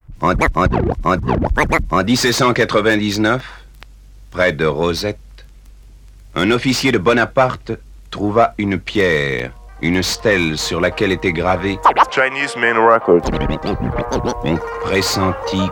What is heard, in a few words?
outro